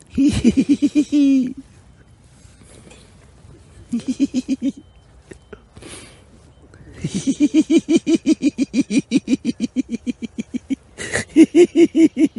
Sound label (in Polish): smiech